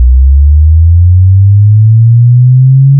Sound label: product, FM Modulation; Frequency modulated signal